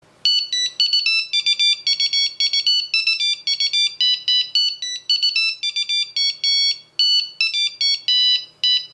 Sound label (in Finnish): sakkijarven polkka